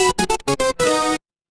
retro game game over

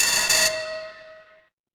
door doorbell

